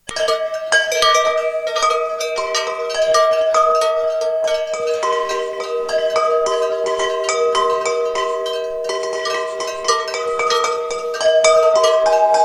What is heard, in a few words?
with cowbell